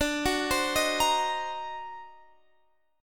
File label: D 7th Flat 9th Flat 13th D, chord